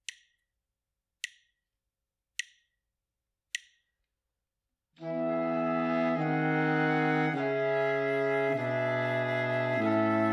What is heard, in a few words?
harmonic dictation; eartraining